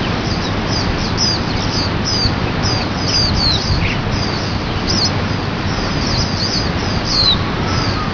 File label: jweye, birdcall